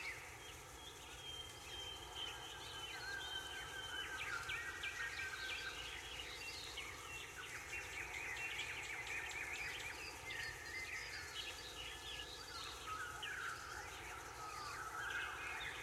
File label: ambient sfx; Burning Reels; wazdan